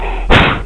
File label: Train puff